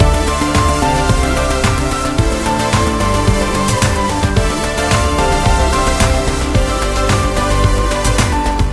epic loop; astro Legends Lyra and Erion Desktop; Foxium

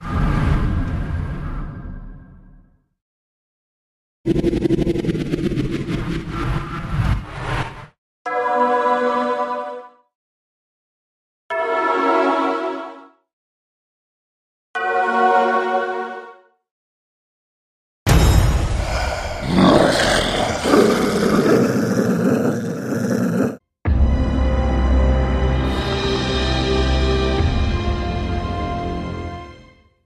Wheel Spin; Wicked Tales Dark Red

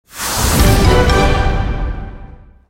Super Big Win Appear; fire Wizard; Axial

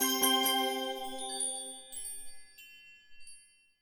normal win; Skillzgaming; gems odyssey desktop

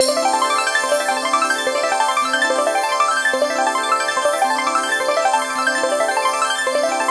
Count Up; Lock A Luck

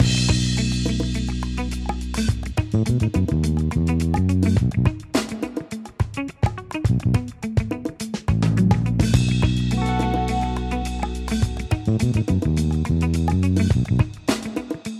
music base; riviera Star Desktop; Fantasma

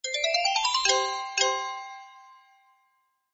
aloha bonus win amount; hawaiian

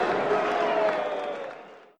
Negative; genesis; euro Golden Cup Desktop

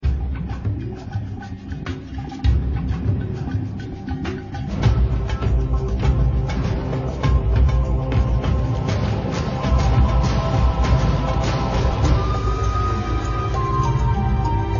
preloader loop; Recode; jewel Quest Riches

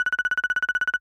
bigwin countup; riviera Star; Fantasma

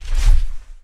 Bonus Scroll Intro; Wu Xing; genesis

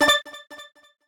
auto spin; astro Legends Lyra and Erion Desktop; Foxium

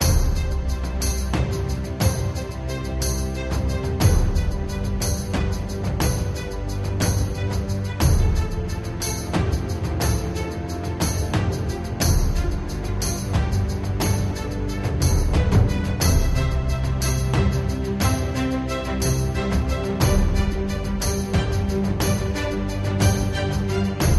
Music Free Spins Loop; Wicked Tales Dark Red

